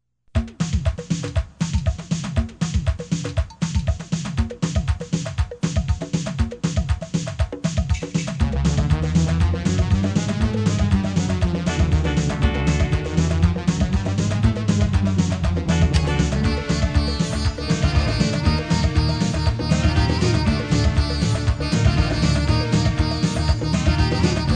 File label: casbah shuffle; fusion of rock and Indian music